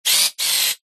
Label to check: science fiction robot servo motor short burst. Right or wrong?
Right